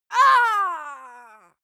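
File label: female death, screams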